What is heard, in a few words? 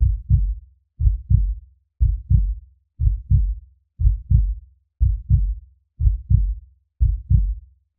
Heard Beat